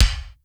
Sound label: ROOM K; Crypton - DrumBase5000; R70 KIT #2